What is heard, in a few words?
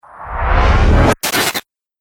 Trailer Hit Glitch; Trailer Sound Effects